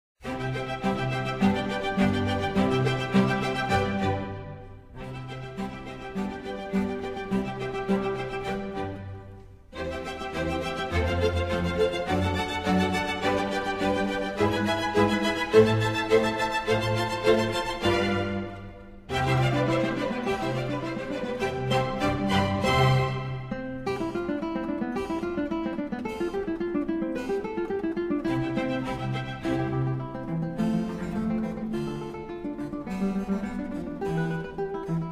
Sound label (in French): Concerto pour guitare; VIVALDI